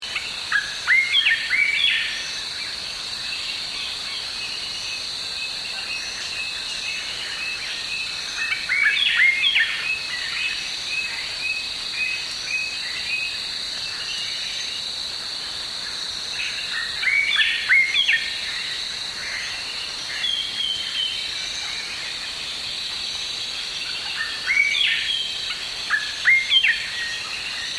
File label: Mt greenbul; yellow-throated mountain; marts; LAHansen